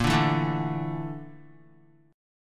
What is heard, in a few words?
Bb Major 7th Flat 5th, Guitar; BbM7b5 strummed; JGuitar